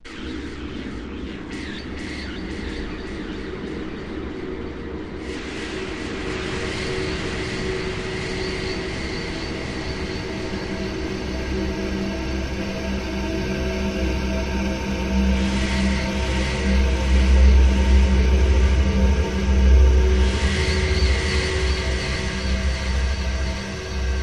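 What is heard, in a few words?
Echoes Deep Rolling Tone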